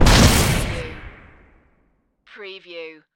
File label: Magic Spell Explosion